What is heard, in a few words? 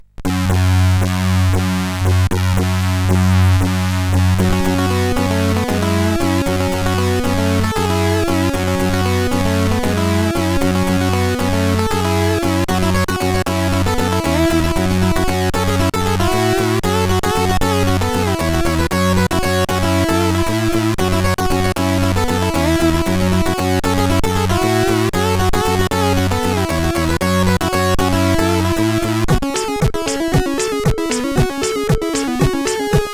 CSG8580; Ambient Light